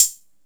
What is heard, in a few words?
dark tone hi-hat sample a sharp key, tr-606